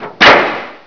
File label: DOOR; ARMRCMD